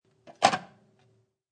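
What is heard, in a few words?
Stack Plastic Tupperware, The sound of plastic tupperware being stacked; Kitchen; Household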